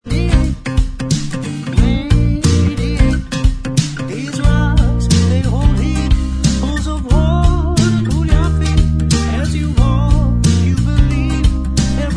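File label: backing vocals Track, backing tracks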